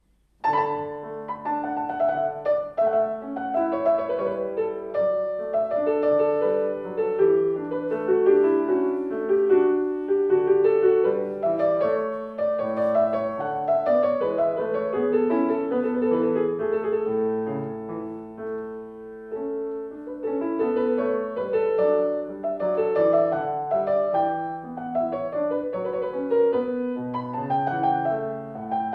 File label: hornpipe